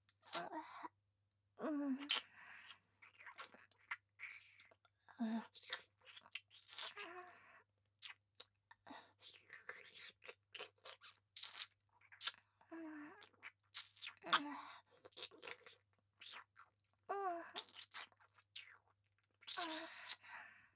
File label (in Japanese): フェラ; 【甘々媚びアクメ】性欲旺盛な陸上部の先輩後輩JK、ベロチュー青春溺愛えっちでコーチの汚ち◯ぽ生ハメ特訓♪